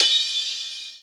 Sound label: HH, Dance Mega Drums; Best Service; HH-HIP